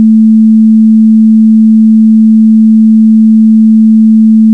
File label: signal